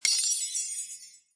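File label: power upgrade; merge-dragons-2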